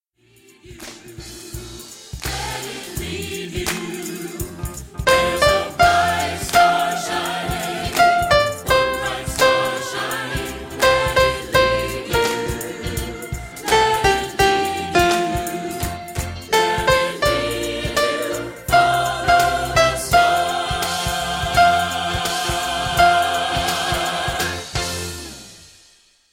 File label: descant only; christmas